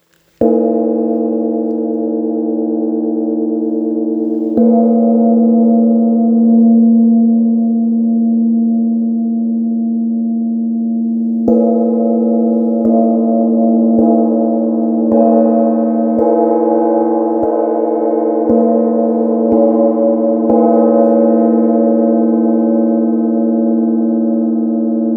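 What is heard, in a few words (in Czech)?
feng, vetrny feng gong bodhi 45 cm; veterny feng gong bodhi 45 cm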